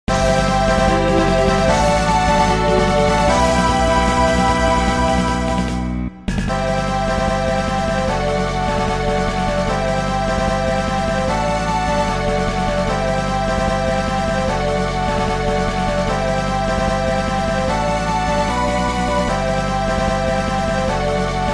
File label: fanfare